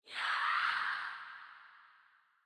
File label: soulspeed; minecraft; enchant